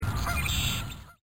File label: goose